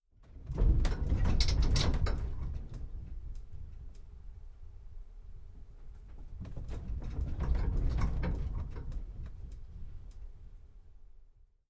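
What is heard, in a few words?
Metal Vibration Light Movement, hits & rattles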